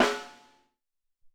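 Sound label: Piccolo Snare 2, Alesis SR-18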